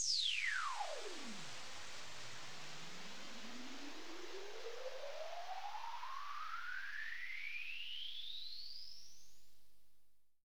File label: hit; Super-Dirt project